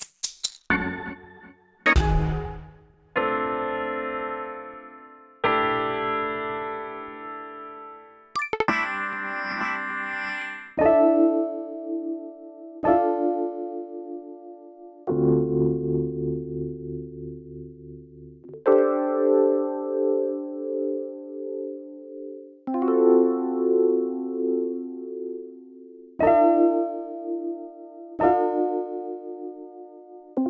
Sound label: Akai XR-20 Hit